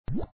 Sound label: talk bubble; papa s freezeria gamerip